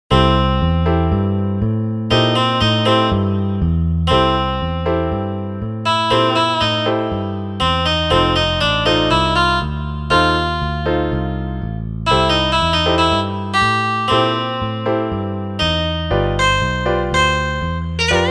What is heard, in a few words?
MIDI blues